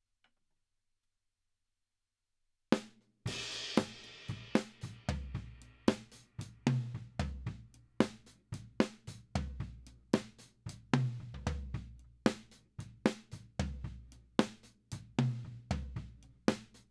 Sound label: Drums